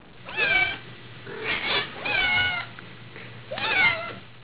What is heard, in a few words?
kitten